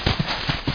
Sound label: Break Leaves; CastleMiner Z; Xbox 360